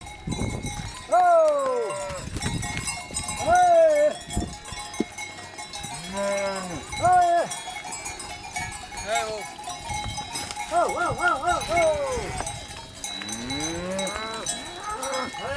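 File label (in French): Montée en alpage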